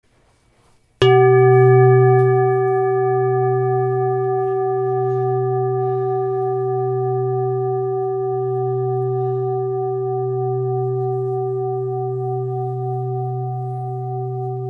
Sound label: chakra bowl